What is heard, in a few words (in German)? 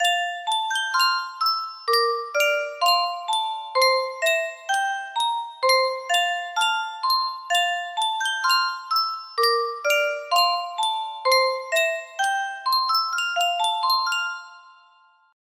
Yunsheng Spieluhr